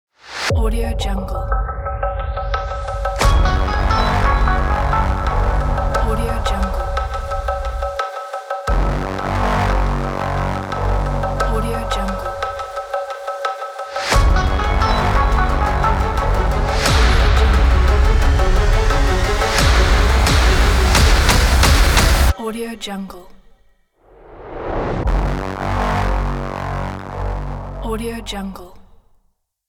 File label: cinematic ident